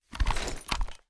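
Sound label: drop movement; gmod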